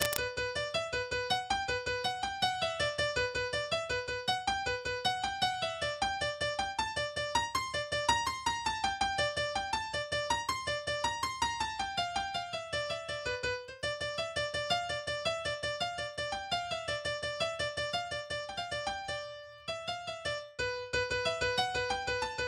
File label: Guitar Vs Piano